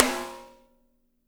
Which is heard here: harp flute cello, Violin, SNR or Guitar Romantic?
SNR